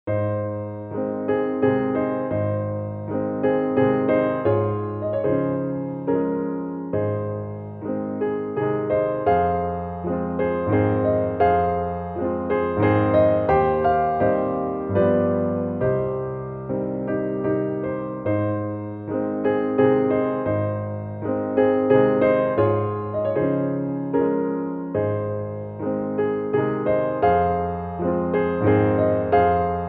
waltz op39 15 piano; classic; brahms

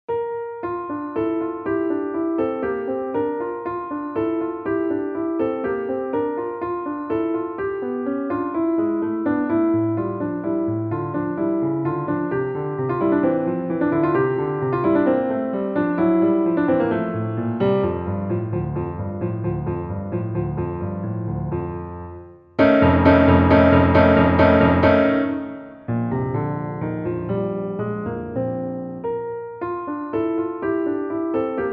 piano; satie

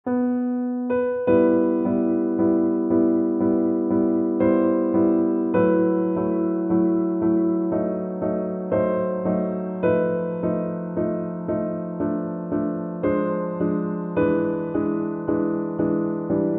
piano; chopin; prelude 4

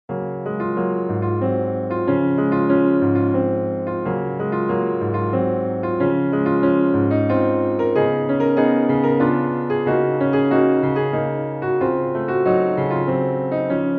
piano; ravel

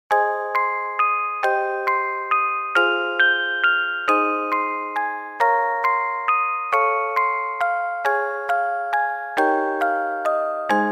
rainy; しっとり 癒し オルゴール; 疲れた心を包み込むやさしい小雨。あたたかいオルゴール。

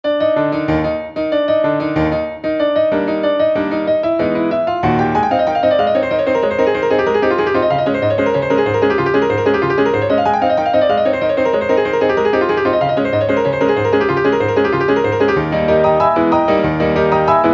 piano; カオス にぎやか 激しい

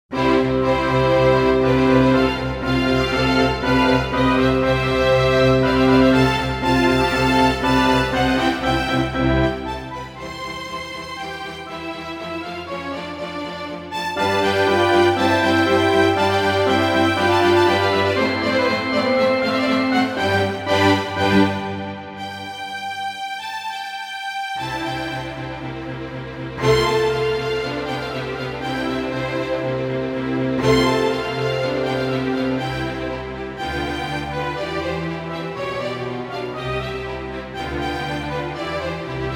oboe concerto; classic; mozart; oboeconcerto1

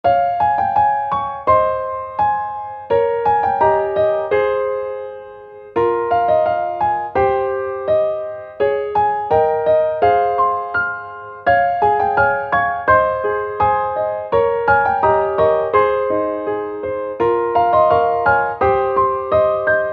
piano; 癒し バラード

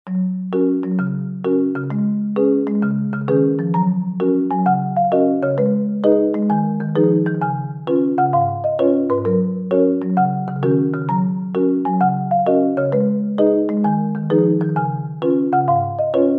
marimba

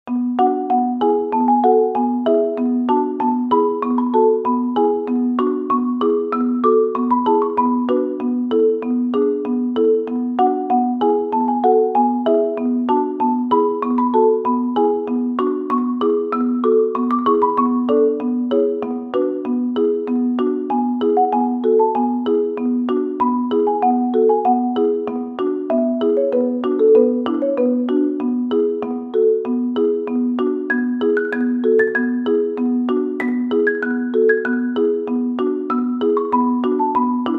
marimba

